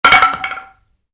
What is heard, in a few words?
Baseball Bat: Dropped Onto Concrete; Sports; Stuart Duffield